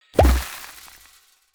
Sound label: confetti pop